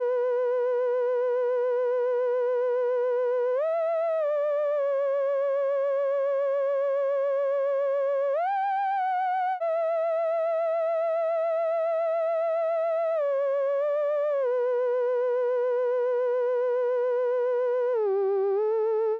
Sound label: theremin lead 100bpm B minor; bathroom-theremin